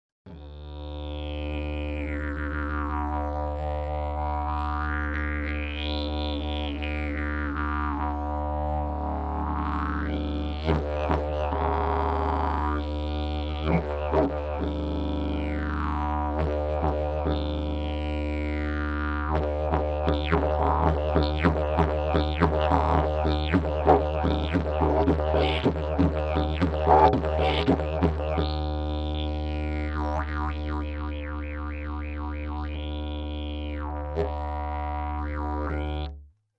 jilm podzimni, didgeridoo

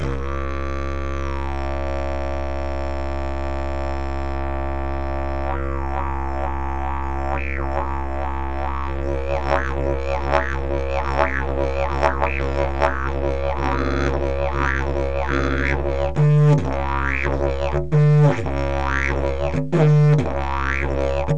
jilm, didgeridoo; mechovy

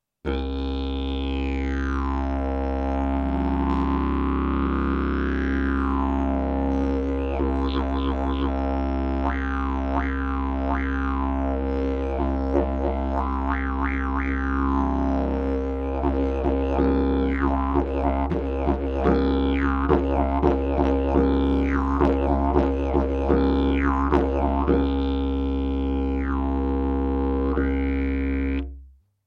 javor strom, didgeridoo

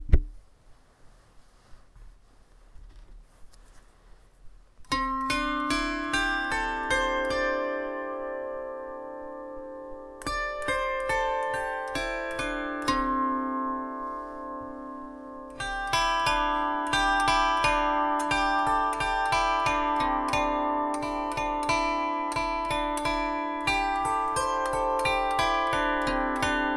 kantela rezonancni sedmistrunna pentatonicka

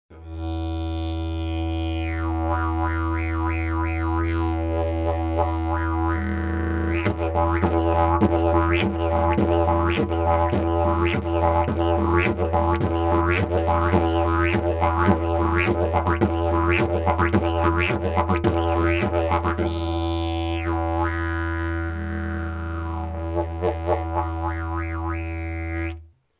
javor, didgeridoo; javor party

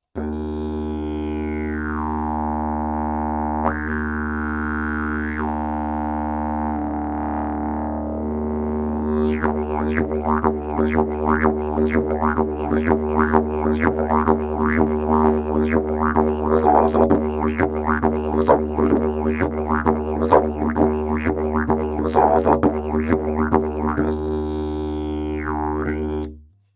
briza korenova, didgeridoo

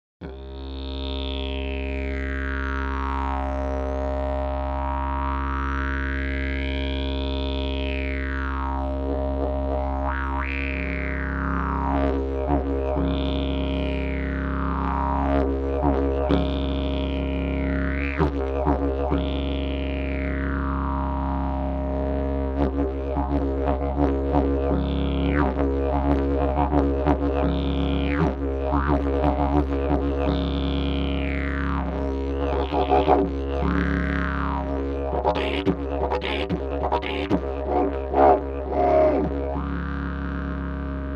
javor, didgeridoo; javor klokan

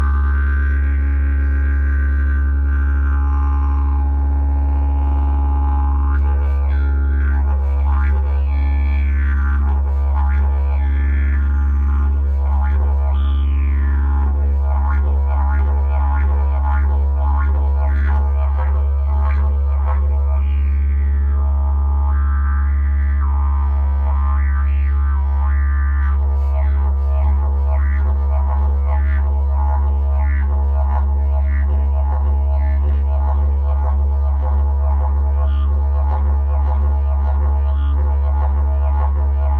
briza, didgeridoo; briza mandala

